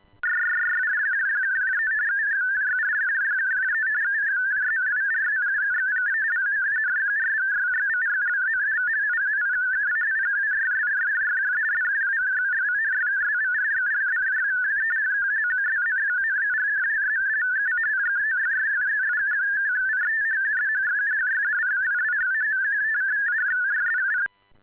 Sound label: ALE400 AMD NO RSID; "ALE400" without Reed Solomon identifer sending an AMD (Automatic Message Display); ARQ-FAE 50 Bd / 8-FSK MODE; ALE-systems